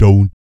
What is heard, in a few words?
PERC, Vocal, Jazz FX, BASS PERC